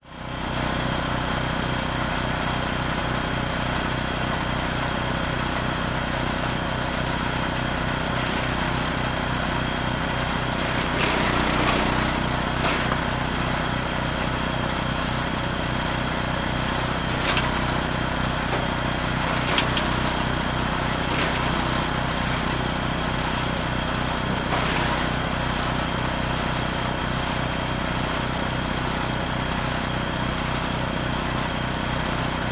woodchipper petrol motor engine operate branches close up to motor; industrial